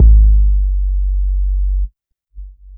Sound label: Yamaha CS6, Drums